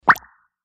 Bubble Pop Wet

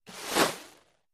Arrow Sizzle Bys Heav; bow & arrow bys

